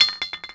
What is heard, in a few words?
glass bottle bounce; bouncyobjects; Tony Hawk's Project 8; XBOX